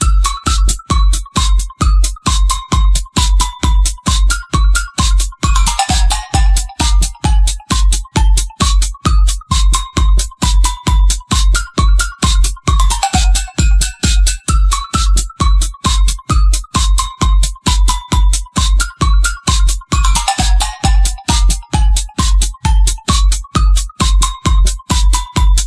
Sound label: Crazy Bell